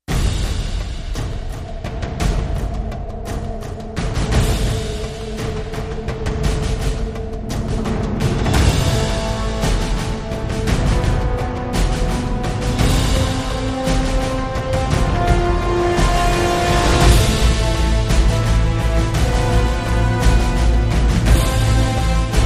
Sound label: No Choir